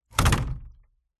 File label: industrial large heavy 10l tank plastic full water set down hard ground; zapsplat